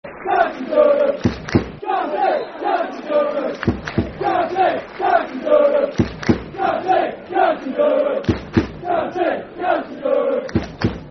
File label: Football Chant, Darlington; County, County Durham; Darlington Dfc